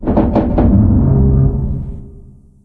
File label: fire groan; ambient; Left 4 Dead 2; Source Engine; random amb sfx